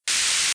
Hiss Loop Small; Playable Tutorial Level

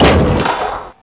Hand Wheel Open; Playable Tutorial Level; TR2

